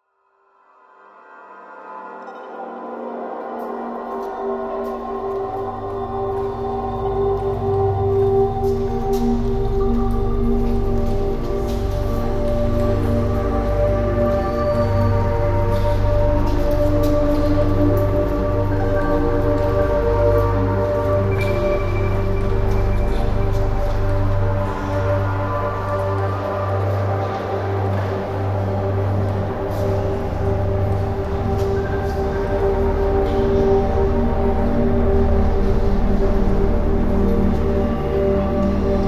lab inside music; painkiller battle out of hell

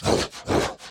Enraged Breathing Loop; Devour; The Town

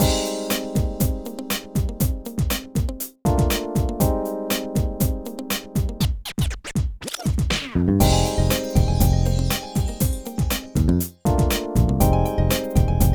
Hip-Hop Stage; Pinky Street Kira Kira Music Hour Pop Princess; Hip-Hop Stage 1

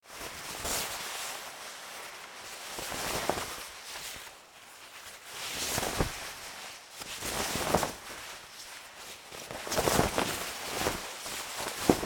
Bike Oil

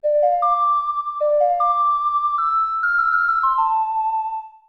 Ocarina

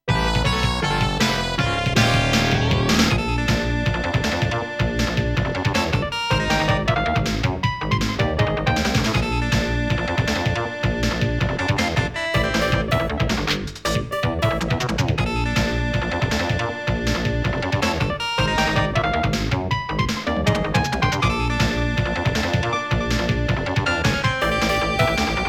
Bonus Stage; Fazz Top; Runark; Gun Frontier

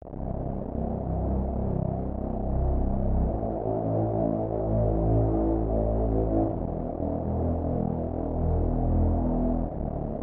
Spooky Music; lost in vivo; Dude

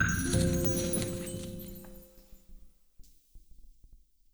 stinger item

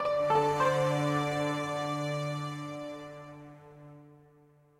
Calm; Forbidden City

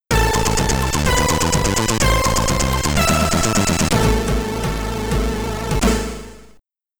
Exit Open (SID Stereo); Dyter 07; commodore 64

